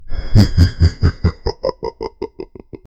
deep guttural demon laugh

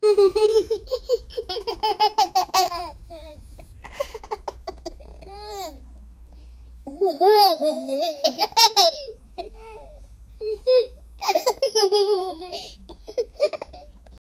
sound of child laughing

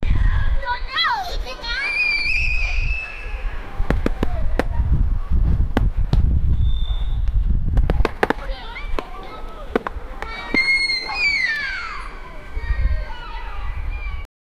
children playing basketball

